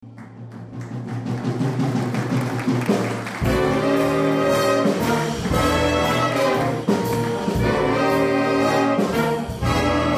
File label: Big Band